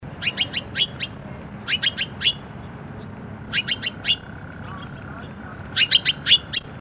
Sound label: Formosan Magpie